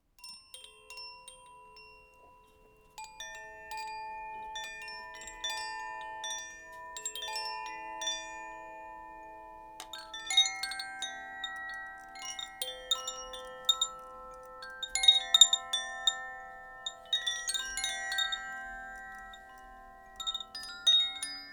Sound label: Koshis